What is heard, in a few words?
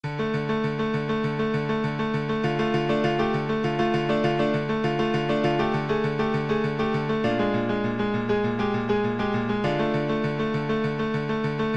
piano